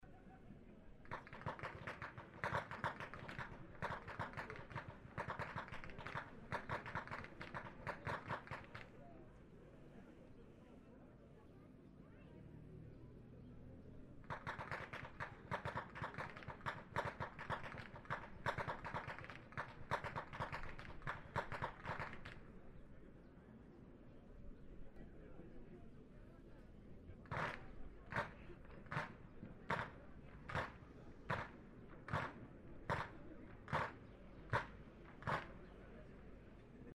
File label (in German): schnalzen, jugend; waging